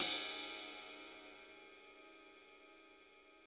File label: ride one shot d sharp key, Royality free ride cymbal sample tuned to the D# note. Loudest frequency: 8737Hz